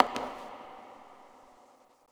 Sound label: succubus step; queen girl